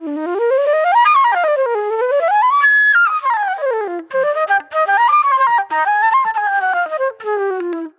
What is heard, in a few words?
Fast Funk Flute; Sonic Foundry (Sony Creative Software); Manhattan Flutes; The Funky Mama